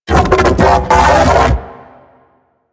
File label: Mech Ambient Vocal; Warframe; DE; Vehicles